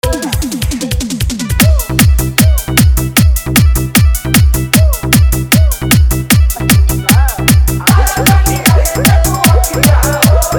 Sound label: Dhollki Bass Dance Mix